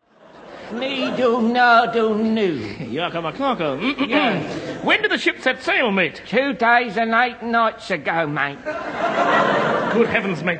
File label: splashes